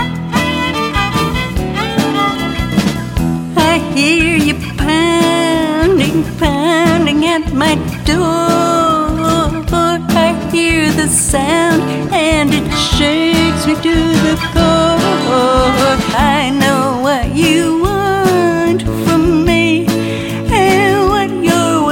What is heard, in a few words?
Blues